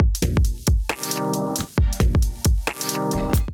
Kit Melodic 135 bpm, Drums; MIDI Clips; Maxi Lachs Kit; Ableton; Factory Packs; Skitter and Step

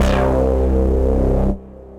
Ego Bass; Ableton; Punch and Tilt

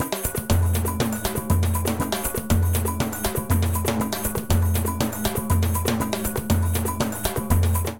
Samba 120bpm, Latin Percussion; Ableton; Factory Packs

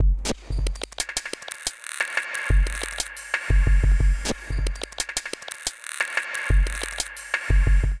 Technical Glitch Kit; Drums; Ableton; Factory Packs; Glitch and Wash

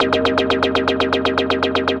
Synth Lead; Scaler K; Ableton; Factory Packs; Punch and Tilt

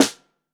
SN Bottom, Snare

